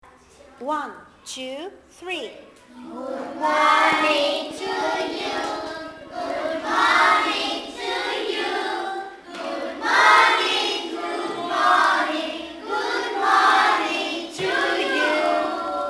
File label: good morning